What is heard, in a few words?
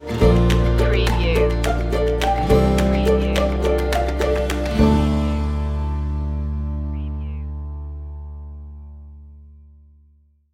clean path stinger